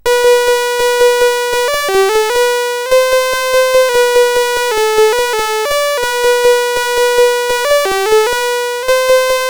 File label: sid CSG8580R5; Christmas Eve BASIC